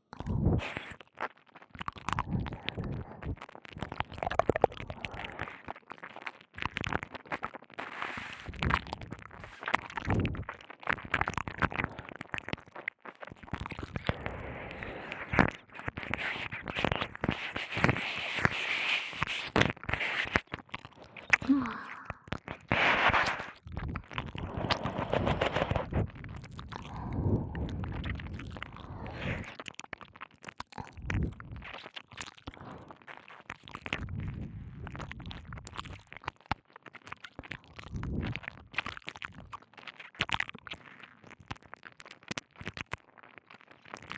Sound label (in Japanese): 耳舐めの音を楽しむ; 綾姉のお耳開発レッスン編